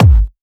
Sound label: Dubstep Kicks